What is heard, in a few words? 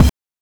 BD + HAT; NES DPCM; MIDINES